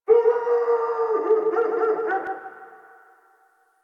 Distant Ambient Dog Bark; Ambience; Warframe; Nineteen Ninety Nine

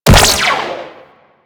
Flak Ship Rifle Fire 3D A; Crp Flak Ship Rifle

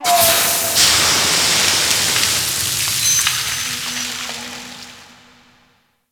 Ocean Steam Blast Small; Ambience; Warframe; Grineer Ocean

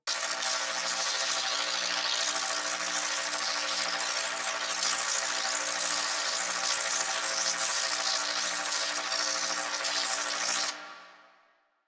forcefield loop; Warframe; Ambience; Hub; Tenno Junction Relay

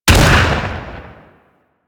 Hand Shotgun 2D Shot, Weapons

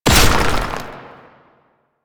Archgun Charge Full; Warframe; Archwing; Weapons

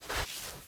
Foley Idle Ear Scratch Slow; Enemies; Kubrow; Warframe

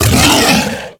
Feral Vocal Angry Attack Short; Warframe; Kubrow

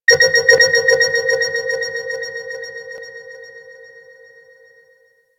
Collar Activate Call; Warframe; Alad V; Abilities

